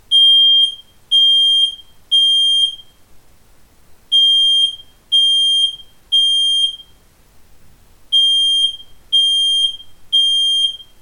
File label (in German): jalo kupu 10 rauchmelder alarm